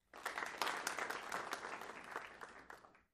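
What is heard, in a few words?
Crowd 50 Light Burst Interior; small int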